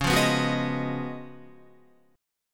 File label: C sharp 11th C sharp, Guitar; strummed; C#11; JGuitar